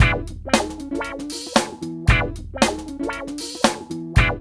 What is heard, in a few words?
LOOP